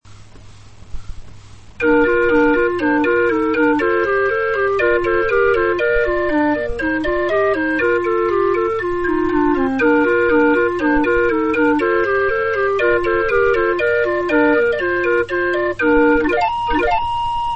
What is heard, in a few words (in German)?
floetenuhr